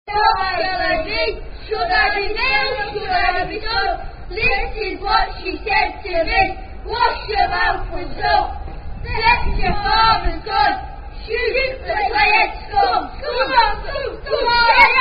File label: Shoot The Scrum Chant